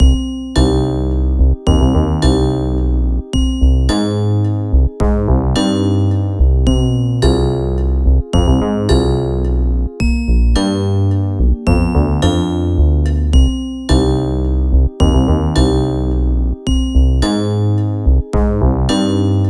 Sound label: bass; bass explains; weeklybeats-2018